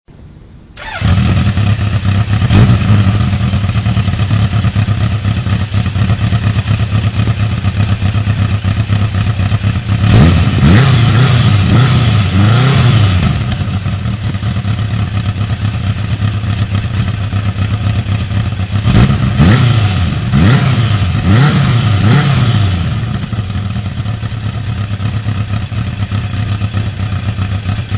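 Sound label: cobra500 exhaust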